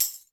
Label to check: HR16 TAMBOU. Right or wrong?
Right